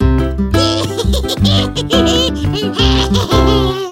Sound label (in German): Sound LACHEN; Motivation; mainzelmaennchen